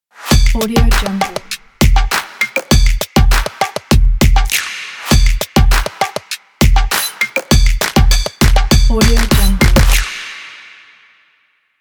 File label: stomps intro logo; singlefx